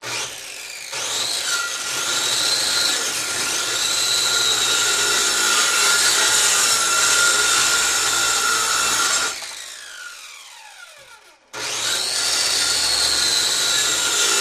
Saw Elect Hand Cutti, saws

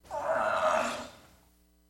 Camel Grunt Soft; camels

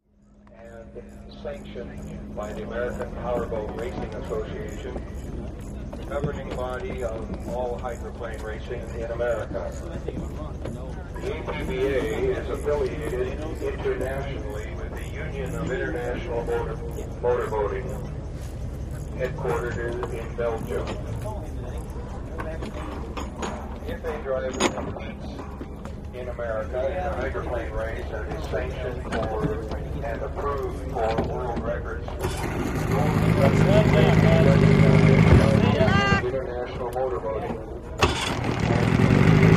Boat Race Pit Doc Amb, motorboats

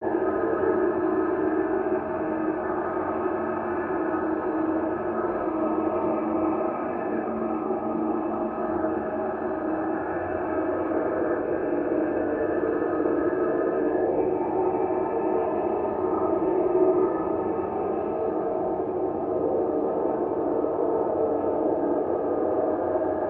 Ambiance Tonal Whir; drones & tones

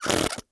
Staged Tire Blowouts

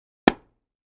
Axe 2x4, axe hits

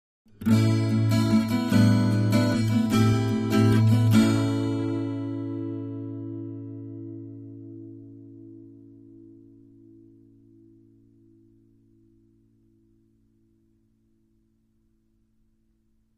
Acoustic Guitar Happy

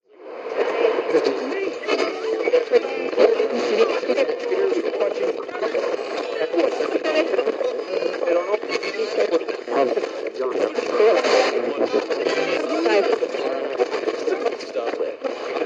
Eerie Radio Static; communications

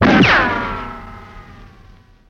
Bullet Ricochet Ban, riccos

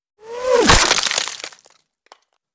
Bullet Impacts Wood I

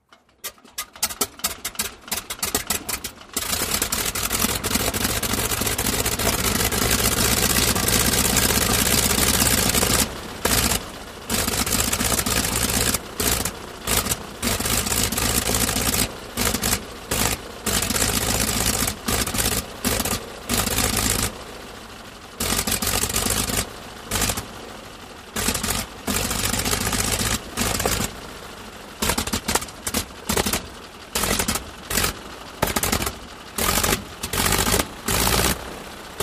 Prop Plane Start Idle, int

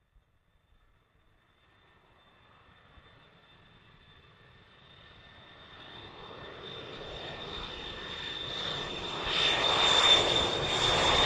Jet Fly Over Thresho, jets

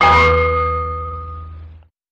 Ding, metallic hit with boing, deep; chimes & bells

